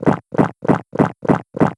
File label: drinking